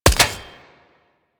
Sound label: Fishing Spear Damage Hit; Warframe; Venus Fishing Spears